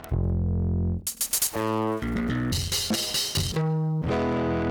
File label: Saturator; Virtual Analog